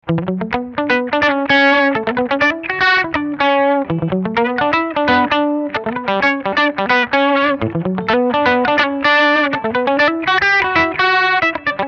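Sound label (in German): Brücke HB bypass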